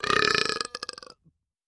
Med Belch; belching

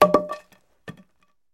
Baseball Bat Wood Dr; baseball fx & games

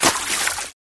FS Shrt Splash, splashes

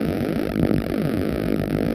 DISRUPTER; disrupters

